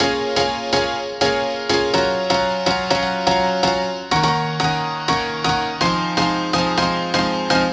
House UKG Piano, Bbm, 124bpm; house ukg keys

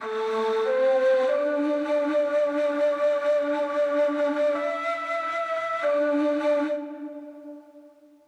Flute, Am, 145bpm; Little Ethnic Vol.1

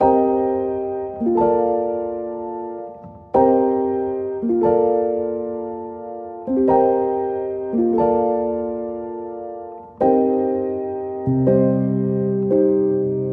LO-FI PIANO Chords, Cm, 72bpm; LO-FI PIANO VOL.1

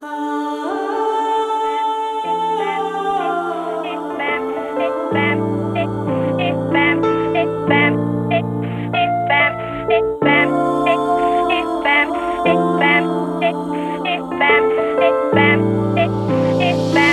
Dm CLASSICAL piano; 3pack piano bundle

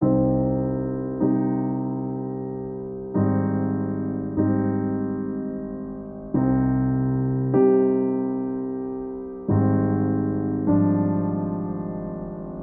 Classical Piano, Cm, 76bpm; 3pack piano bundle

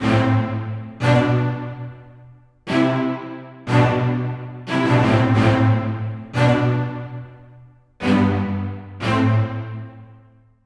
strings Marcato Hip Hop 90bpm A minor; hh strings

